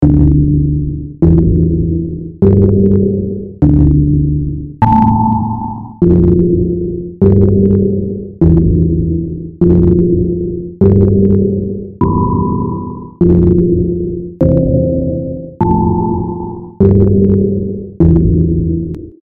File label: Clockwork Occupy Loops